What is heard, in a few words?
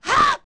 Attack; Gerudo; zelda; OOT